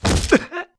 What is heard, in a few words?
Poisoned; Link